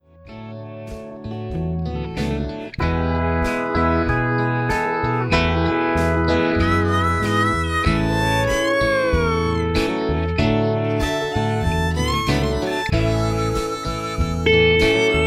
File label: Smooth Loving Steel